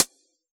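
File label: T9 hi-hat Closed, Yamaha DJX; 155 DJX Kit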